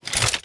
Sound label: scabbard